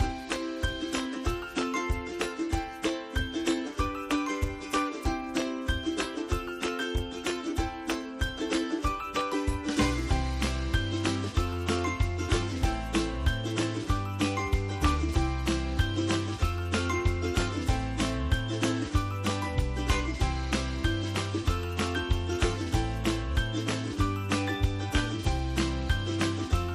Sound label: music cheerful; bgm